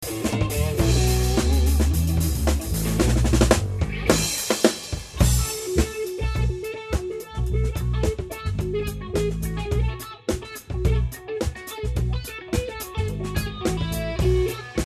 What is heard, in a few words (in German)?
stereomikros, gitarren